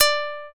shamisen

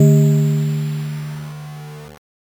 gong

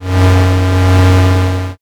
space engine

